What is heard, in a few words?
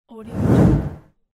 woosh